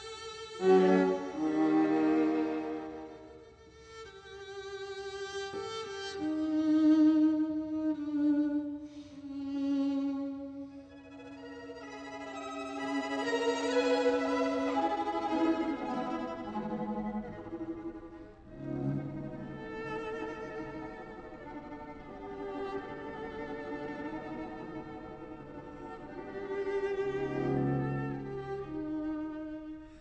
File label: classical